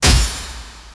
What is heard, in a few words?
display smash